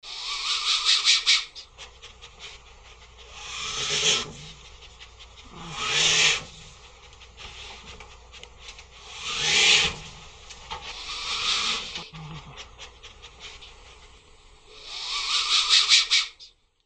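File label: anteater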